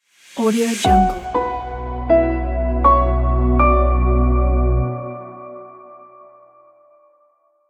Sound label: simple logo; gizzzy